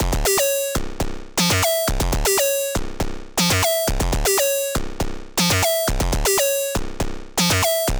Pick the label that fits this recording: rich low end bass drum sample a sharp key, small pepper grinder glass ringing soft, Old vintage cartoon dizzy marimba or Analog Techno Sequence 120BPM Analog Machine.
Analog Techno Sequence 120BPM Analog Machine